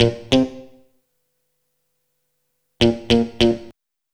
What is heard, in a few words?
GTR, Funk; USB Soundscan vol.47; AKAI